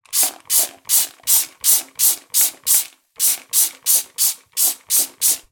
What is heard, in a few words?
household toilet cleaner spraying into toilet